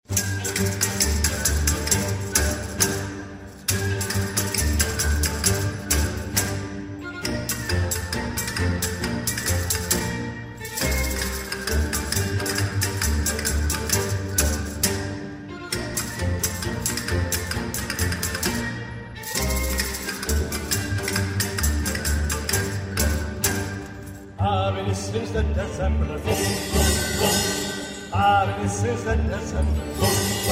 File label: hled male jezulatko; Prosinec je, jsou tu svátky